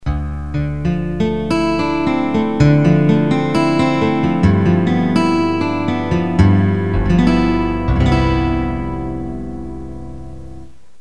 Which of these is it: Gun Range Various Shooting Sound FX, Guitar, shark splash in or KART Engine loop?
Guitar